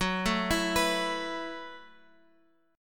Gb Minor 11th Gb down Guitar Open F; Gbm11; arpeggiated

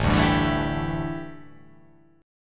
A 7th Suspended 4th, A, down

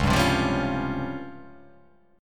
C sharp Major 7th Flat 5th, chord

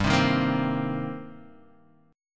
Eb 6th G

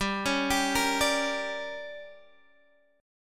D Major 7th Suspended 4th Sharp 5th, chord

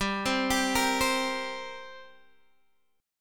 G Minor Double Flat 5th G, Guitar, Open G; chordshape; down

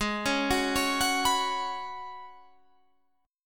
Db 7th Suspended 4th Ab, guitar

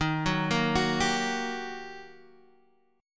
G Minor Major 7th Sharp 5th, Eb

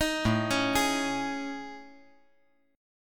A Major 7th Flat 5th, down

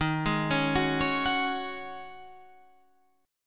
Ab 7th Suspended 4th Eb

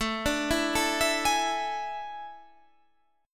A Major 7th Suspended 4th, Guitar, Standard; AM7sus4; arpeggiated; JGuitar

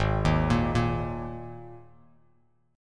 Ab Suspended 2nd Bass Standard; arpeggiated; JGuitar

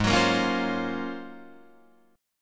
Ab-13th-Ab Guitar Drop D, Ab13 chord; strummed; JGuitar

